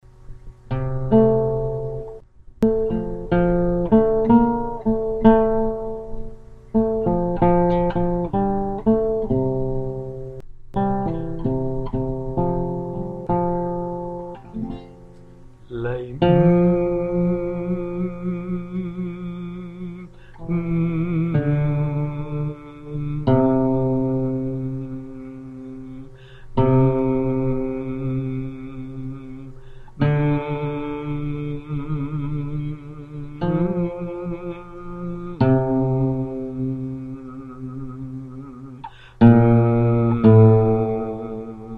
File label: Alto